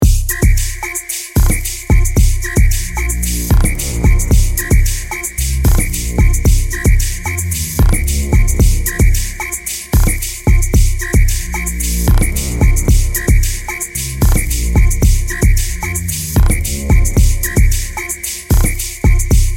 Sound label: long version with whistle; ZOL Zimbabwe